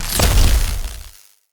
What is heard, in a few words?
Shield Enemy Impact